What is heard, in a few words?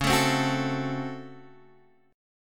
C sharp Major 7th Suspended 4th Sharp 5th C sharp Guitar Drop D